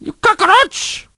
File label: Crow get hit